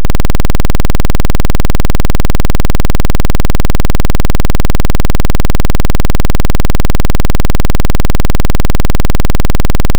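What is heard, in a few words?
square 10Hz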